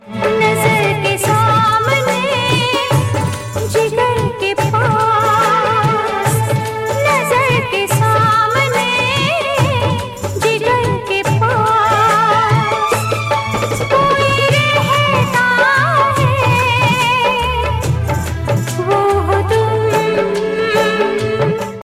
female; aashiqui

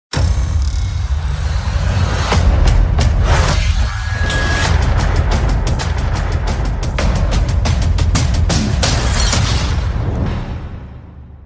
trailer version tone, ringtone; avengers infinity war

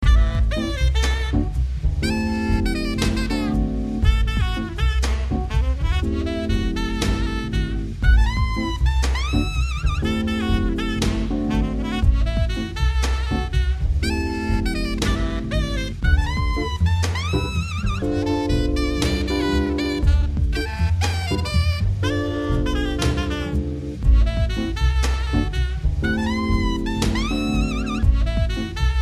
jazzy tune; ringtone

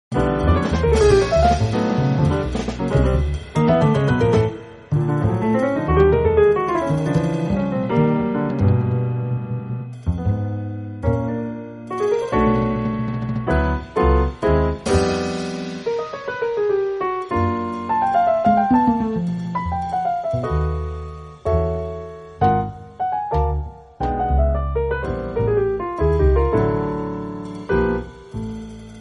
jazz

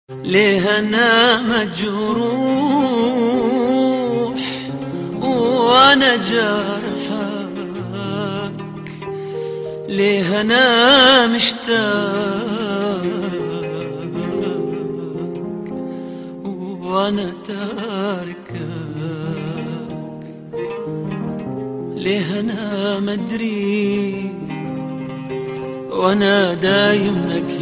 music arabic

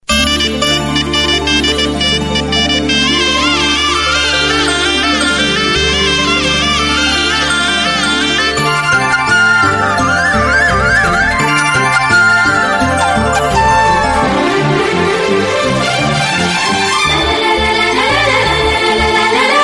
Tamil love ringtone

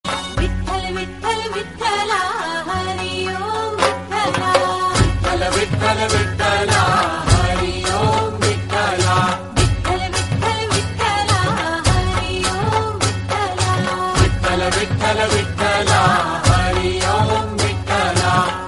vitthal marathi ringtone

